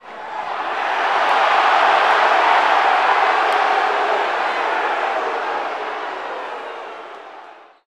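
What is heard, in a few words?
Basketball Crowd Reaction; SFX Kit; Crowds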